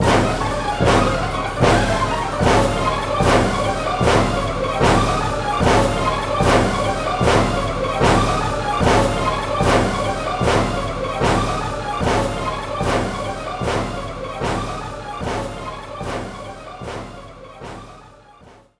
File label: Spooky